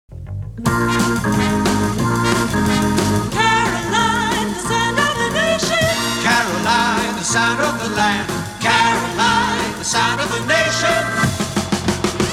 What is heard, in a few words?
Radio Caroline Jingle Sound Of The Nation